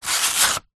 industrial sand paper single rub against sand paper